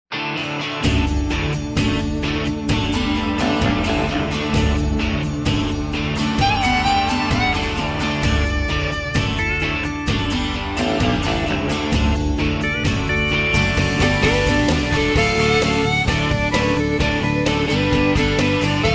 country

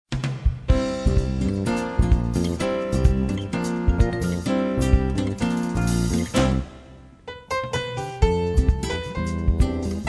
light jazz french cafe

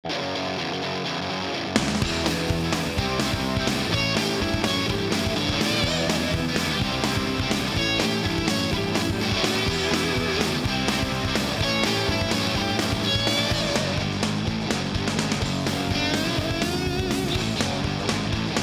hard rock

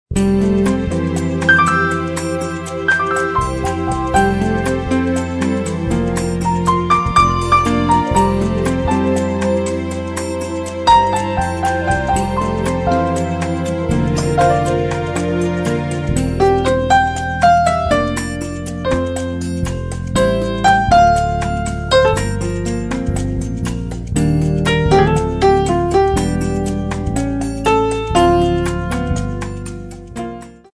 easy listening

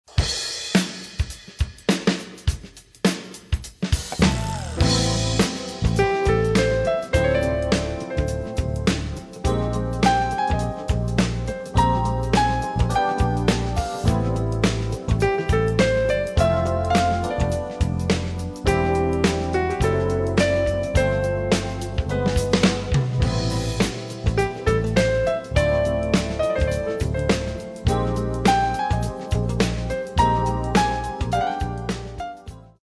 light jazz

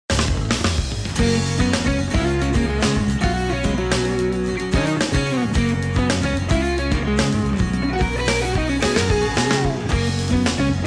light rock

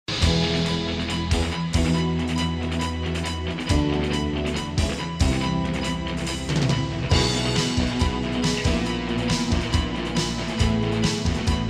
Light Rock

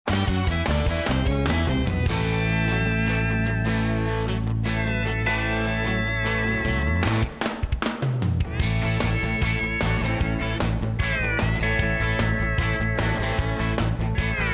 country

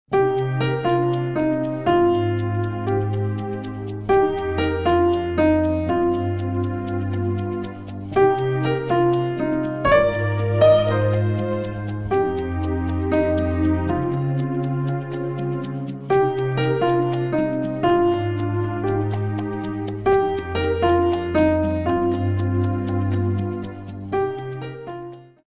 light jazz

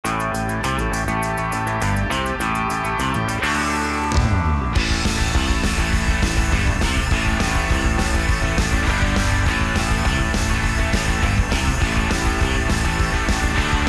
hard rock motor racing

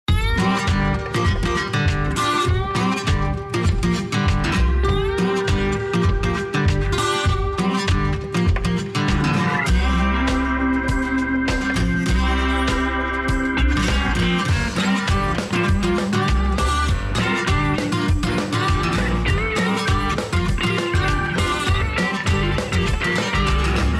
country bottle blues

